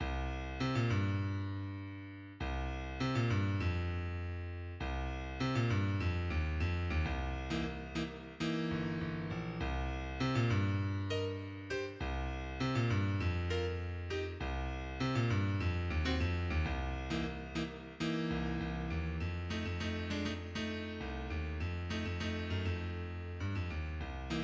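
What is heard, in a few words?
jazz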